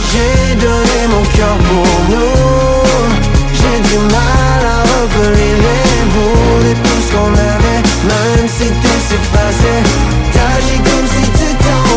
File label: hook